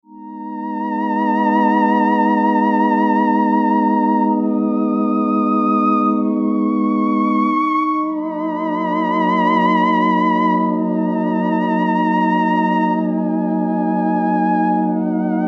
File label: ASM Hydrasynth Voice Strings